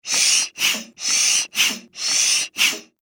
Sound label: Extermination, Bee, Smoker, Pump, Multiple, Pesticide, Insect, Bug; Blastwave